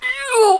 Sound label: Get Up; TR3; Playable Tutorial Level